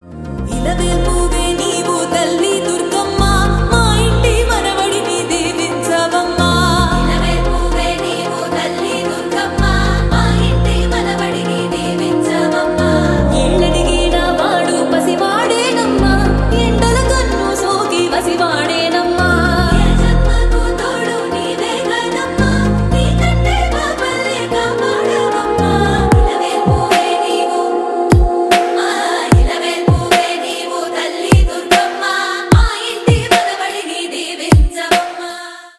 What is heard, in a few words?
Devotional; The Raja Saab